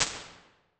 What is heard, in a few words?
Early Reflections Wood Room; Impulse Responses; Ableton Live 12 Lite